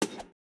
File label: slot reel bounce